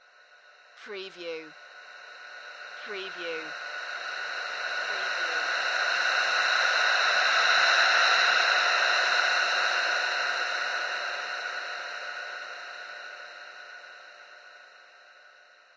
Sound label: Ectoplasm Presence